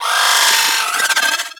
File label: Drone Vocal Player Spotted